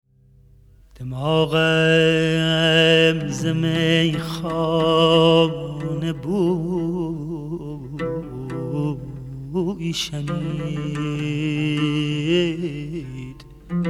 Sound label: Avaz